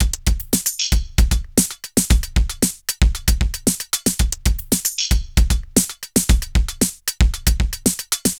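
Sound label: drum loops, right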